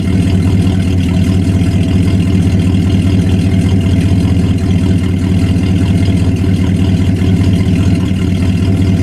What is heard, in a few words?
exhaust sound